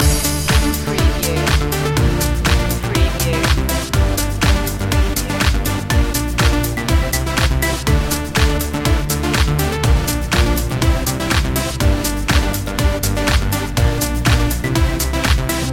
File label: ibiza summer loop